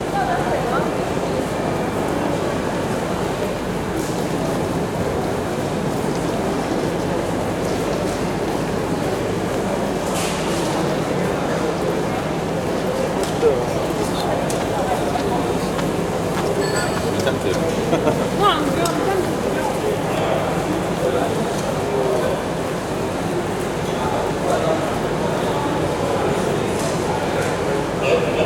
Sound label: Train Station Boulevard Entrance Ambience, British Train Station Ambiences; Train Station Atmos; Jake Fielding